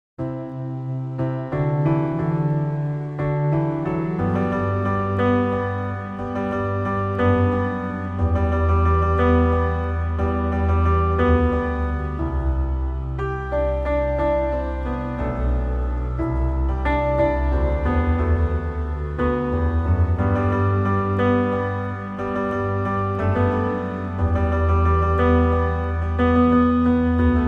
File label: piano